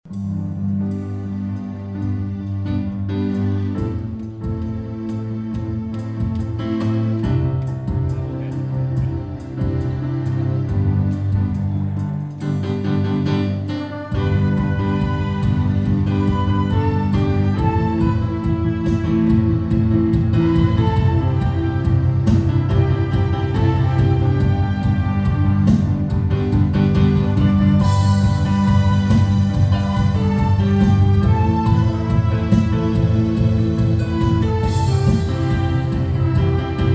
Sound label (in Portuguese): Piano e Cordas